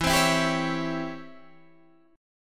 Db Minor Major 7th Flat 5th E

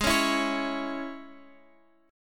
Ab Suspended 4th Sharp 5th, Guitar, Open F; Absus4#5; strummed; chordshape

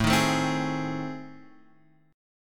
A Minor Major 7th Flat 5th

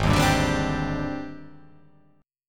G sharp Major Sharp 11th

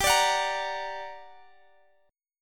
G Minor Major 7th, down, Guitar, Open G

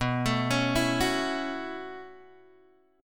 B 9th Suspended 4th B down Guitar Open G

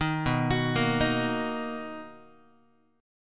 B Major 7th B down Guitar; arpeggiated; JGuitar

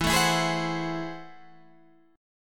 A Minor Major 13th

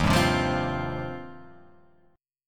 D sharp 6th Add 9th, D sharp, down; strummed; JGuitar

